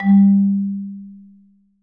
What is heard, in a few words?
Marimba G2